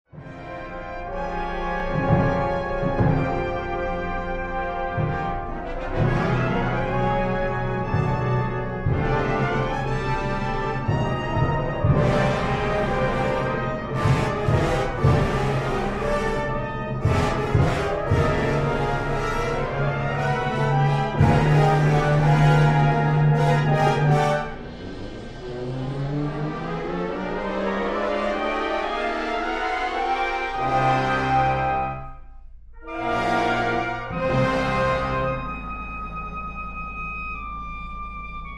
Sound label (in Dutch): Concert voor Sopraan Sax en Fanfare